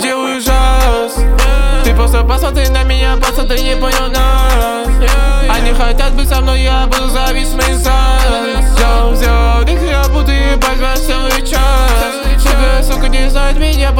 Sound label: New Jazz